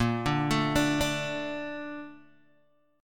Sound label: A sharp Major 7th, Guitar, DADGAD